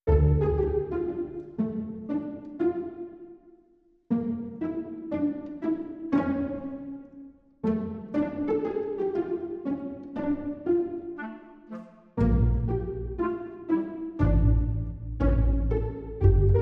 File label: No Percussion